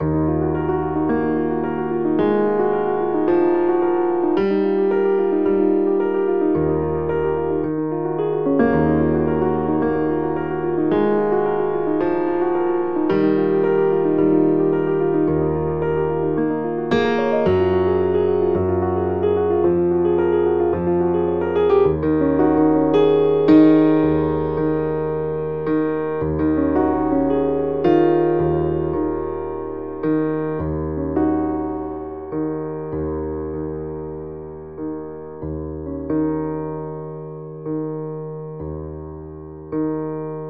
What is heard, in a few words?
Piano